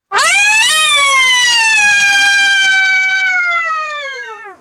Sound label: short raspy party horn siren; cartoon